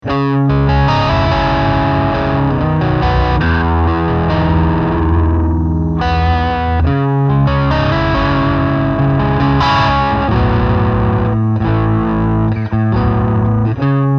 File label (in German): Mit Strymon Zerrpedal